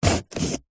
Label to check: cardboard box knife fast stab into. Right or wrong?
Right